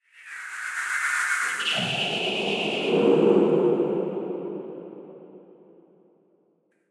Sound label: swell; sonniss gdc